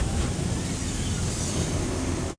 CPU slide; SGI O2 Out of Box Experience 2.2; systour

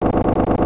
guns

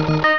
Ammo Pickup; Spectre Supreme Demo

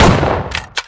RIFLE; outlaws

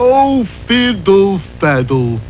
fiddle

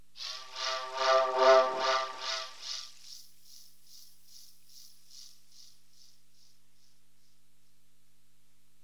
creature

